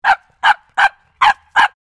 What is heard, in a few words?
Small dog growly barking barks